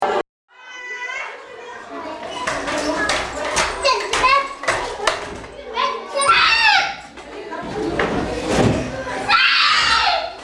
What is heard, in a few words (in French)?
Manifester Mecontentement; pathologie